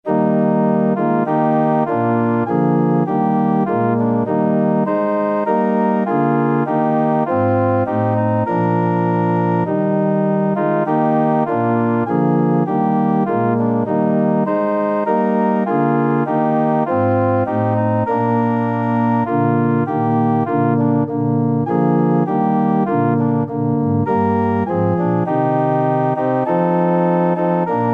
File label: organ